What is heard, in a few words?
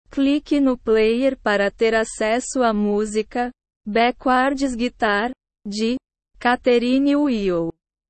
backwards guitar